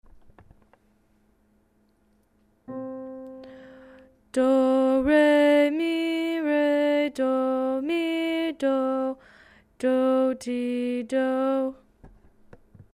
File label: Centering Song